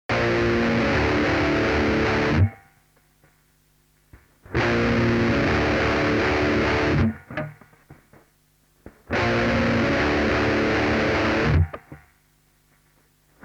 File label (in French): guitare satu